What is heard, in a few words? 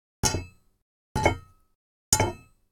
metal